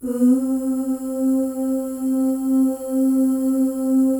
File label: FEM OOS, Symphony of Voices; Spectrasonics